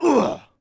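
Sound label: Axeman Hit Vox; Civ4 warlords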